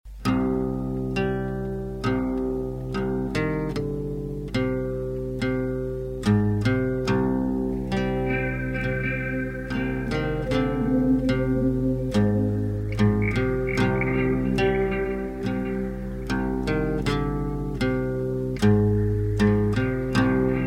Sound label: Jam